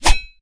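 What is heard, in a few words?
sharp swing of sharp knife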